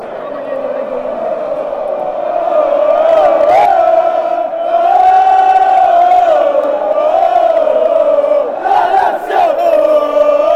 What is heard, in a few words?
oh oh lazio fanchants